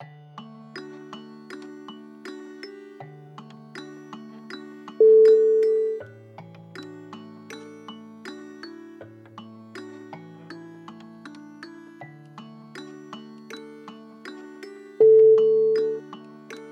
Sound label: warm sleep